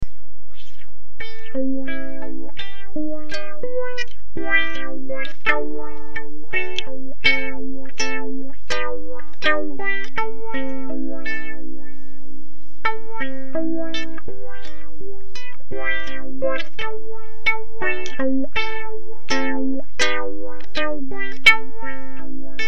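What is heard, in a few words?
uke wah wah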